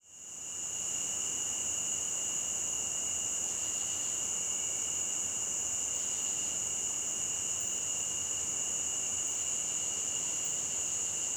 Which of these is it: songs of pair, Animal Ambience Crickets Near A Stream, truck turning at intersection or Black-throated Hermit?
Animal Ambience Crickets Near A Stream